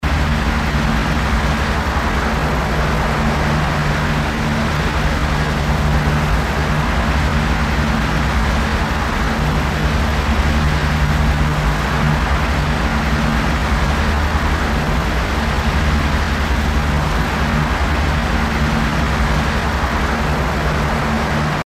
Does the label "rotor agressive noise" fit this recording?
Yes